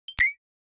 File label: create one shot game sfx, sparkle